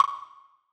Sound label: Percussion, House Drum One-Shots